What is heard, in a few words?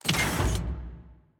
gate button; space-exploration 0.5.80